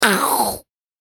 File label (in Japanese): クリーチャー ステレオ